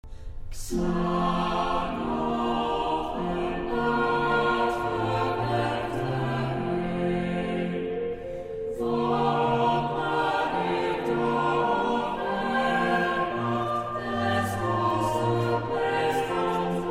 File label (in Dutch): Psalm vocaal